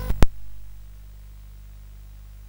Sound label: sid CSG8580R5; Gryzor; hvsc